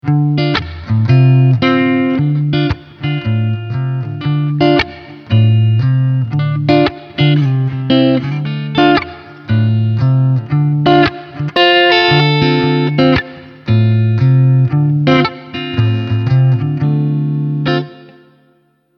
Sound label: Front PU Parallel